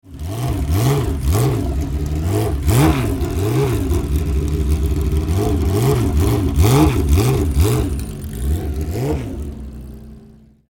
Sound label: Ferrari 206S